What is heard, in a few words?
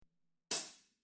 hi-hat